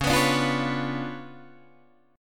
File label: C sharp 7th Flat 9th Guitar Open D, chord